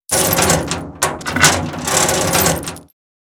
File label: Large and heavy chains on metal